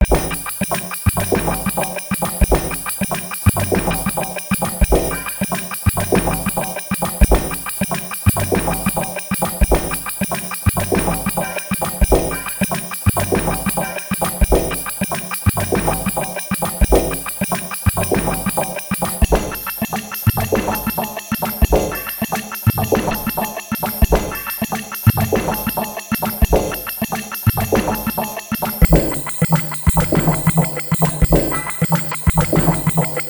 Yamaha Motif XF7 LOOPS Loop

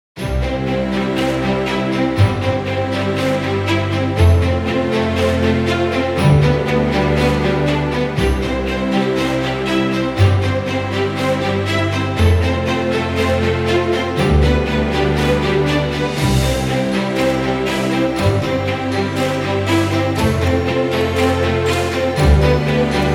Inspiring Background Music

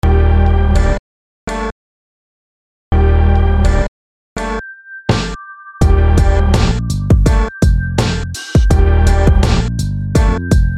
Beat

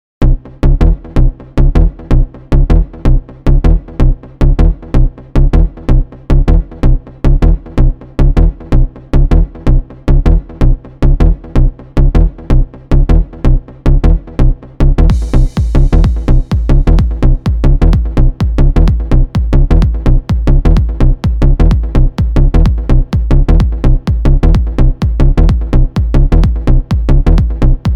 TECHNO MINIMAL

